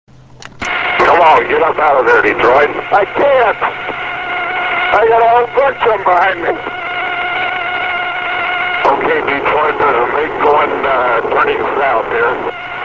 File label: dogfight